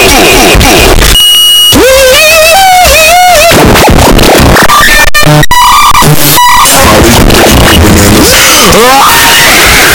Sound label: earrape stuff